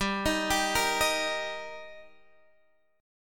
Eb Major G

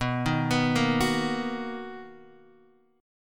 B Major 7th B down Guitar DADGAD

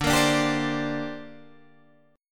D 7th Suspended 4th Sharp 5th, Guitar, Open G

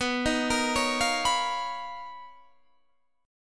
B Major Sharp 11th, Guitar, Standard; arpeggiated; capo 9

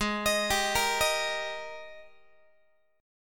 Ab Major 7th Suspended 2nd Ab down Guitar; AbM7sus2 arpeggiated

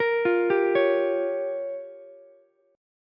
G Minor 7th Flat 5th, Ukulele; Gm7b5 arpeggiated; JGuitar

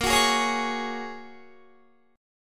Bb Minor 7th Sharp 5th, Guitar; Bbm7#5 strummed

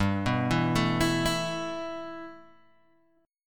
G 6th Add 9th G Guitar Open G, chord

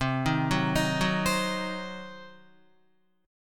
C Diminished C, Guitar 4F,4A,5C,5G,5C,6E; arpeggiated; JGuitar

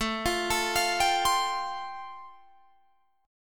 A Minor 7th Sharp 5th A, Guitar, DADGAD; Am7#5; arpeggiated; chordshape; 19,20,19,22,22,22

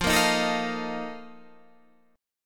Bb Major 7th Suspended 4th, F, chord

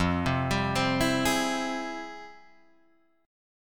F Minor Major 9th, Guitar Open D, chord

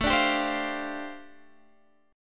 G 7th Suspended 2nd Suspended 4th A chord

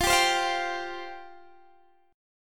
F Suspended 2nd F Guitar DADGAD

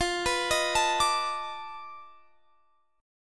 B 7th Sharp 9th Flat 5th, guitar

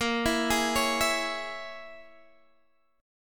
Bb Minor 7th Flat 5th, Guitar, Standard; Bbm7b5; arpeggiated; x,13,14,13,14,12; JGuitar

